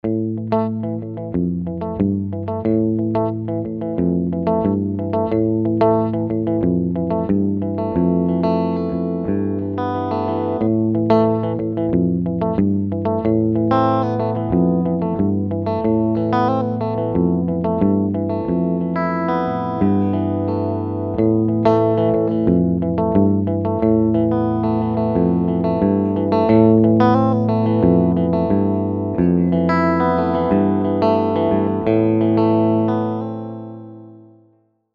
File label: clean; G10A